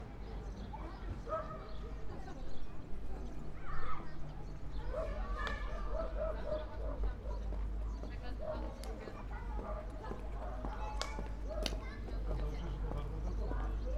School Ring

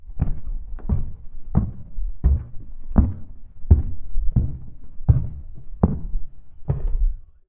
Footsteps Stairs Slow

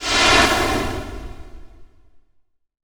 Steam

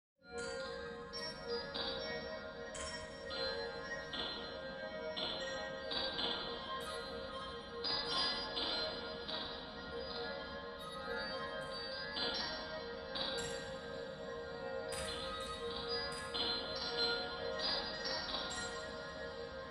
Enchanted Cave

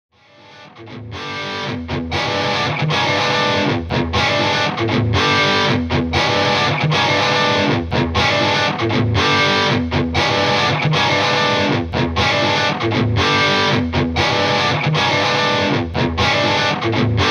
Loop Of Rock

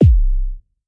Dance Kick

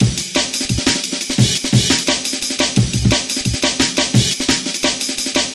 Amen Break Loop 174 Bpm

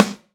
Dnb snare

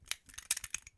Metal Flick

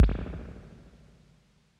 Kick

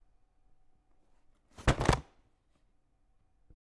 Full Box Drop

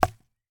Hit Wood

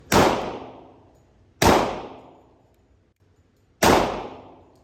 Sig Sauer P220 Interior Shooting

